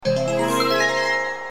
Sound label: small event; Paper Mario 2 The Thousand Year Door